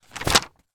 household telephone directory several pages turn at same time